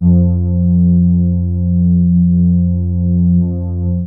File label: DEEP BASS; Kid Nepro; Elite